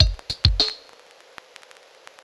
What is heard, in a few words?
drbreak, drumbreaks; drumloops; Masterbits; Vinyl Attack